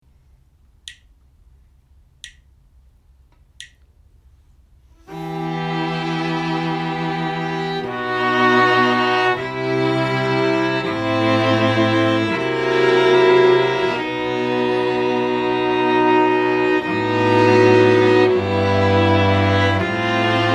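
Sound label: harmonic dictation, string quartet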